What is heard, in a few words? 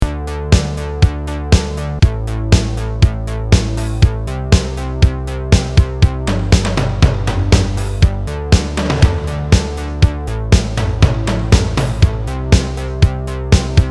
funk synth